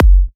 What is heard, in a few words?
F# kicks; markus hakala kicks